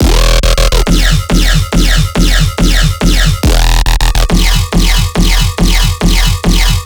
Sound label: Riddim Bass Loop - E 140; Assassin; Ghosthack; Riddim Essentials Volume 2